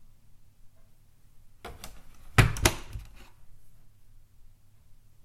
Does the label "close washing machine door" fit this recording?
Yes